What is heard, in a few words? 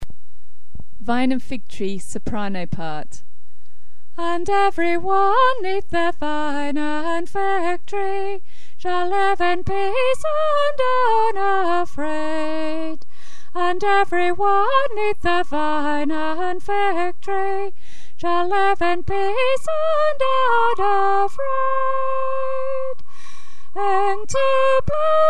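vine soprano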